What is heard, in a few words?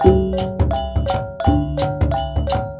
WYANG R, Heart of Asia; Spectrasonics - Heart of Asia CD2; W.KULIT